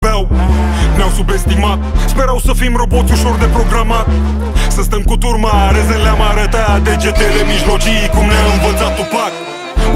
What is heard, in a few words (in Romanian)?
trompetelee